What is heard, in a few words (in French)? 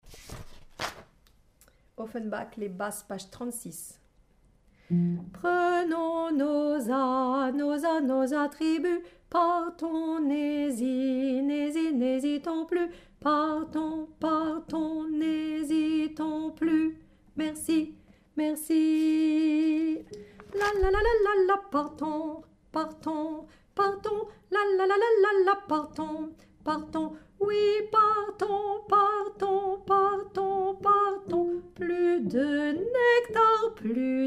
Basse